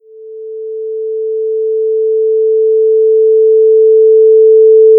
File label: headset audio silence